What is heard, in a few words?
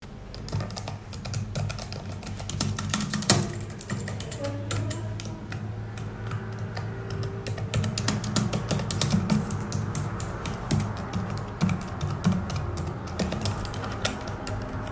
Keyboard sounds